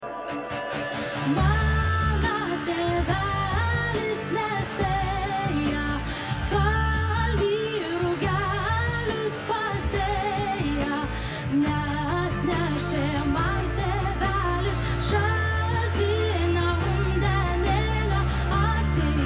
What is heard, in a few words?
Folk